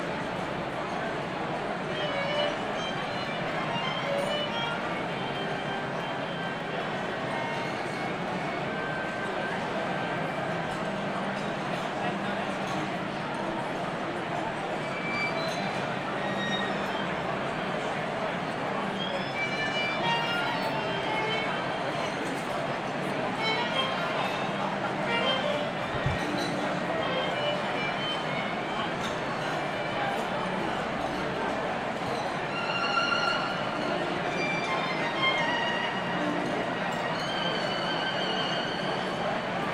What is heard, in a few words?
DINNER PA, Dinner Party Amb; E-MU Producer Series Vol. 3 – Hollywood Sound Effects; Ambient Sounds